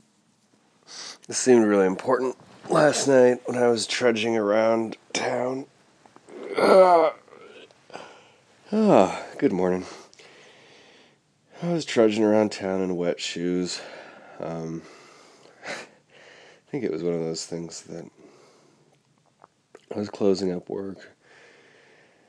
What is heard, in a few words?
tuneless whistling